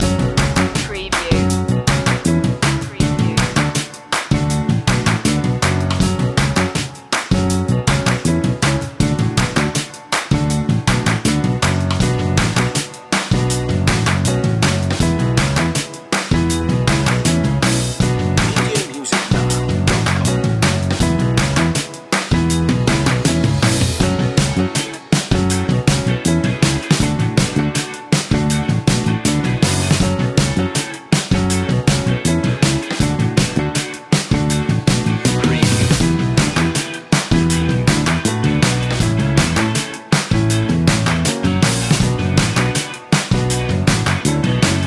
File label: funky hop